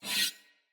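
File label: Dagger Whoosh Accent, Swords; Weapons; Player; Josalfar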